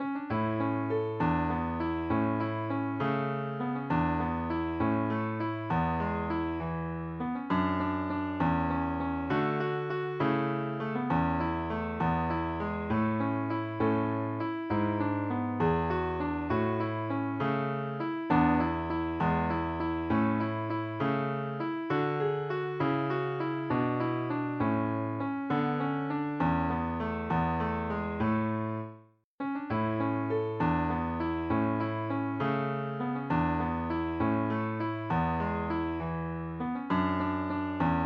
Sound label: guitar; joyful moment